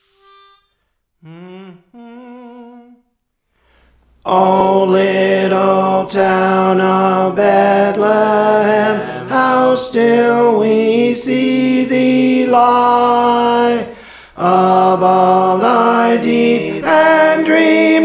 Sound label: Tenor, Songs; Praise For The Lord Training